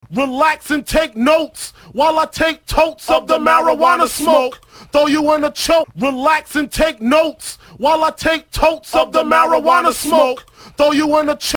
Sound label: Relax and Take Notes Loop